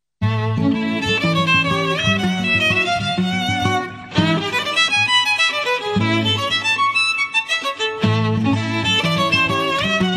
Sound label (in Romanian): hora in a; sharaban